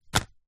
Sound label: household medicine laxative powder sachet set down wood